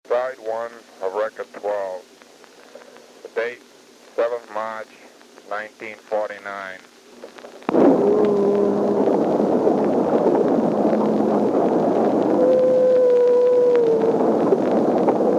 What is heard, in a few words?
whale; 1949 recording; ocean; whoi; whale of a disc; ocean history